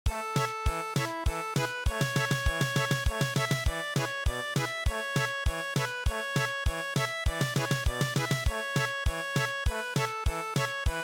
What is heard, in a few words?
German Polka